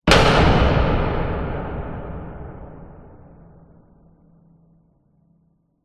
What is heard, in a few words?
sound design cinematic hit hard loud metallic reverb; zapsplat